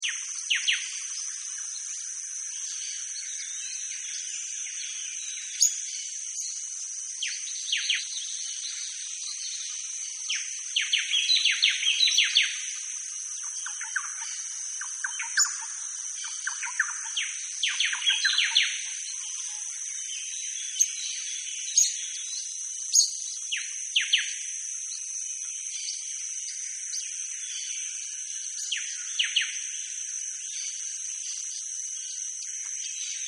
Amazon River Jungle Ambience; Nature Scenes; Forests and Jungles

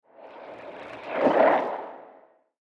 Alien Alligator Attacks; science fiction sound effect; star wars / trek style games

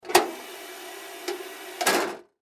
Water Drinking Fountain On Run Off; Kitchen; Household

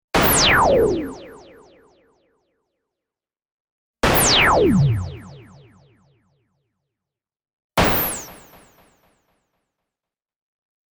Laser Gun, 6 x Futuristic Laser Blasts; Lasers

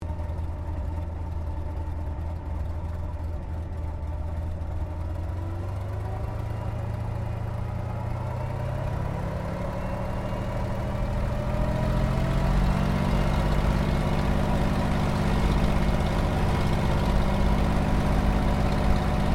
AIRCRAFT P-40 KITTYHAWK TAXI INTERIOR; Warhawk / Tomahawk; Airplane; Vehicles

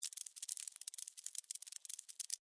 Spider Walk, Spider, Walk, Arachnid, Insect, Bug; Sound Effects:Animals:Insects

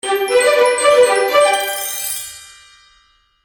Magical Music Finale, Cartoon Music Sound Effects

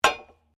SLEDGEHAMMER METAL IMPACT HEAVY; Working with a sledgehammer; Hammer Sound Effects; Tools

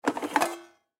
Coffee Pot Remove From Stand; Appliances; Household; Blastwave

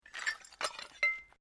Glass move; Material components for constructing more complex sounds; Materials

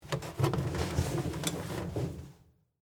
Bleacher Seat Down; Blast Wave

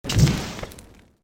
Created Magic Spell Fireball Impact; Series of created spell sounds

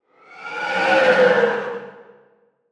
Whoosh Ghostly Howl, Horror, Haunting, Pass By; Ghost Sound Effects; BlastWave

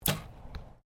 Archery Competition Bow Firing; Various archery sounds; Miscellaneous Sports

